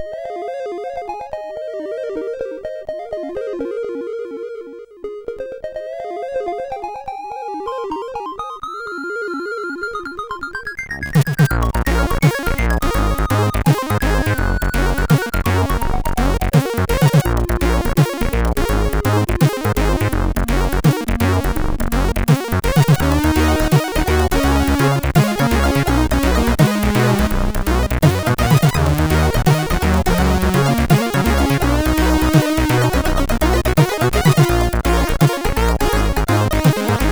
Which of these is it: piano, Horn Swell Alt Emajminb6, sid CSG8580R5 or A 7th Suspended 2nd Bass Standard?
sid CSG8580R5